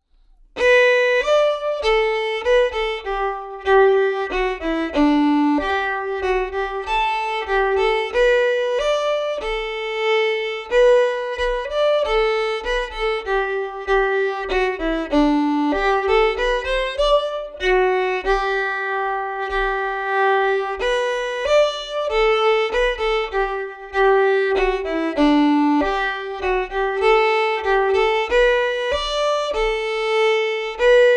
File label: stam villlum slow in g, fiddle; stam villum